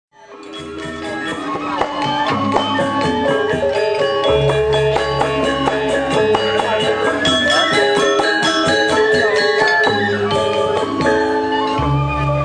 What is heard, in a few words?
gamelan